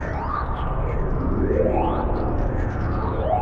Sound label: TECHNO FX 140